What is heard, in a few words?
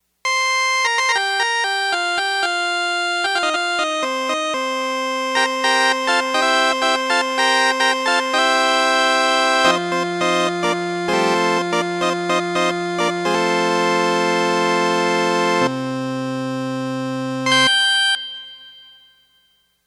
Vox Continental